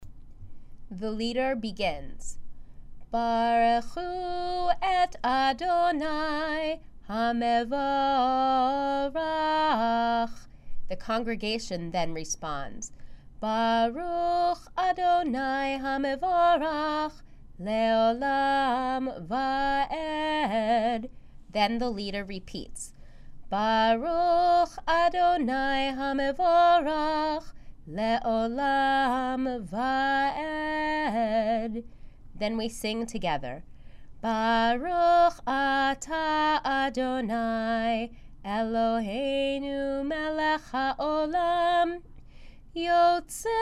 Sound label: barchu